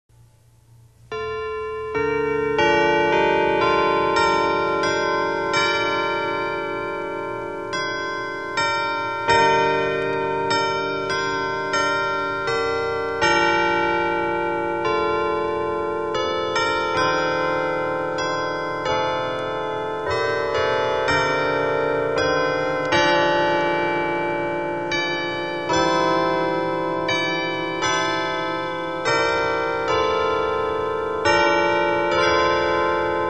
carillon chimes; in the garden

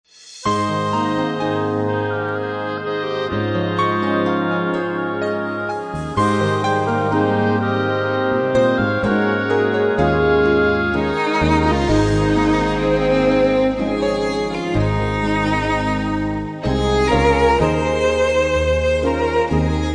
Instrumental Hymns

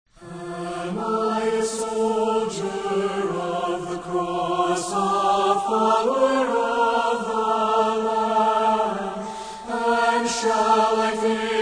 Choir And Orchestra

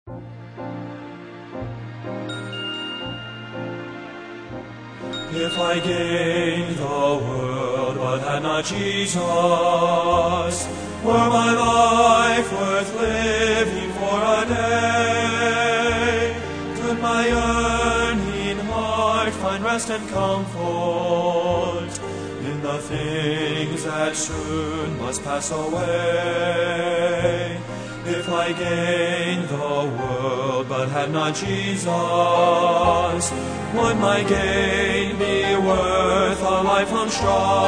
Trio, If I Gained the World

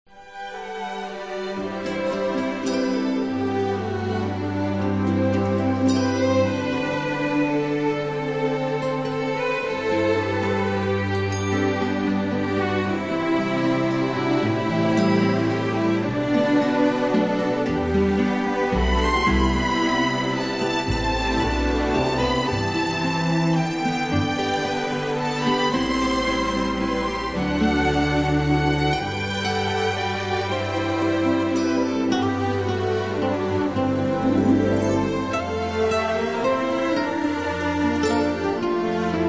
Orchestra, Strings

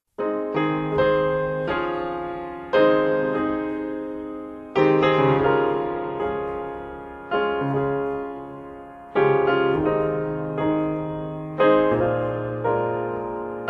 piano solos

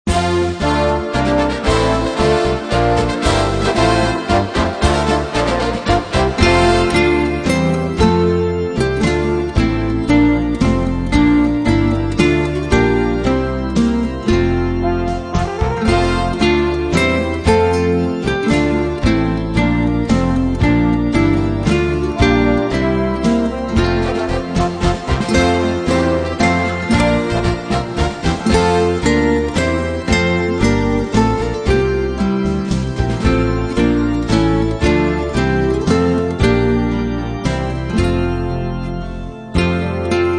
Instrumental Hymns

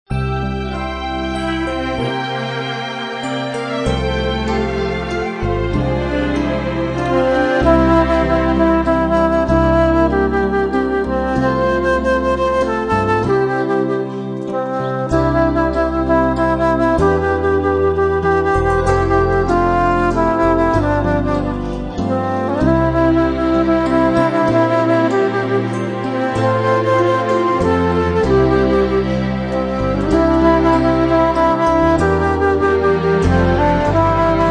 Instrumental Hymns

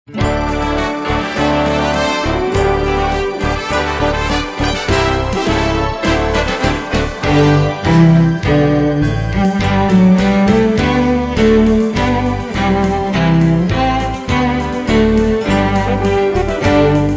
Instrumental Hymns

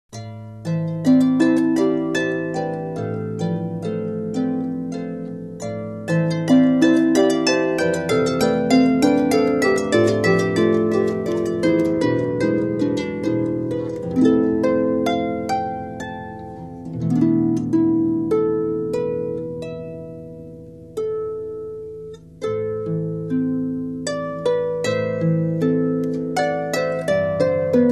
Harp

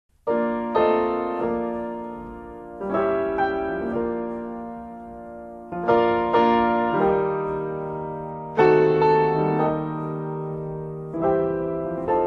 the old rugged cross piano solos

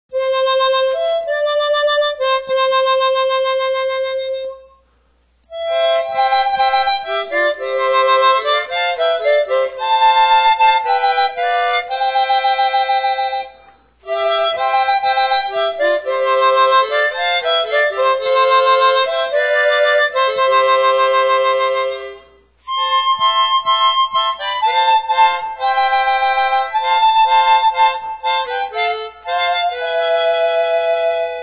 Chromatic Harmonica

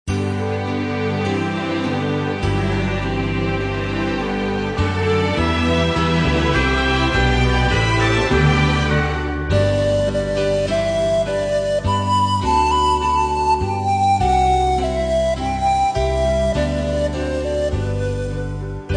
Instrumental Hymns

